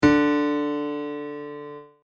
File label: D3 audio music note